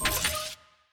reel switch; netent

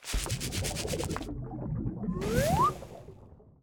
crab burrow; netent

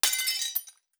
monkey throw

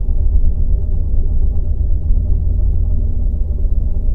reel Spin; PIXIGSLOT; netent

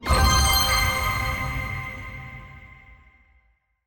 medium win; dracula; netent

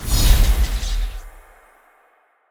reel expand attention; netent

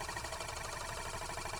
reel spin

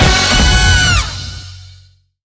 reel Arrow Both; netent

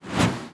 bet Line Smoke

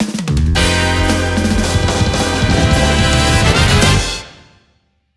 cash stack end; netent

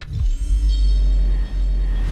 pre big win

